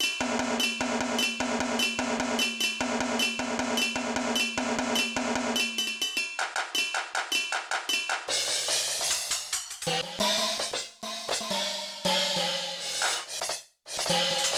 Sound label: L-R Stereo, Drum Kit, Yamaha TX16W